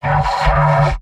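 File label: jeers, robot; pyro; mvm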